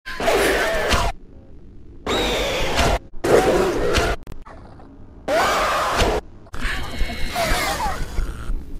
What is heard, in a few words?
jumpscares; mini smiling critters